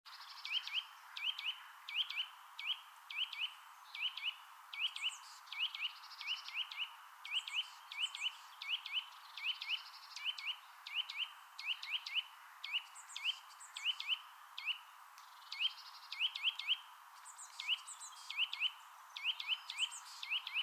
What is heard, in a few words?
Sitta europaea; SETE